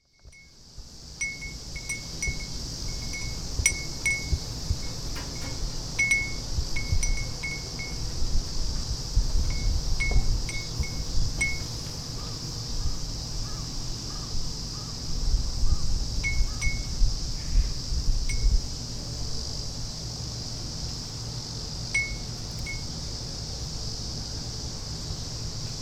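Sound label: wind chime; fuurin; kikushima; Chino Cultural Complex ARCHIVES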